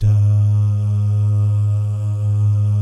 DAH, jazz FX, mix guy gal

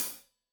Hat Loose, Hi-Hat, Cymbals Stereo

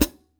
HH PD, Drum 1 Dry; East Collexion

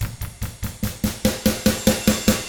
rock 6/8, drums; ILIO; Double Platinum Drums 1

